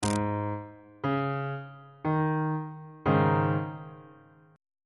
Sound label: d flat suspended 2nd 2nd inversion